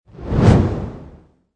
Fireball Swoosh, Fire whoosh, fireball flying through air or torch swipe through air; creating a flame whoosh sound; Flame Sound Effects